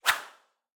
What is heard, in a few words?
Fencing Foil, swoosh, room ambience, lively, quick, aggressive; Eiravaein Works